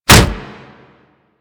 Kick Hit Default; Melee

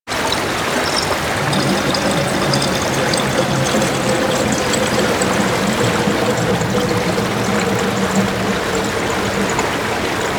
Water Drain Layer; Warframe; Ambience; Eidolon Plains

